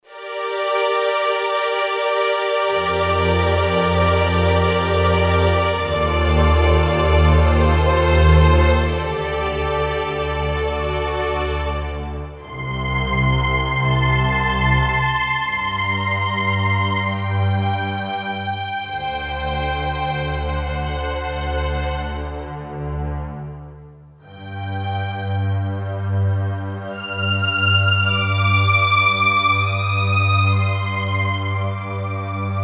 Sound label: Concerto Str; Yamaha PSR-550; Voice